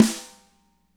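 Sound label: snare right; Spectrasonics; Burning Grooves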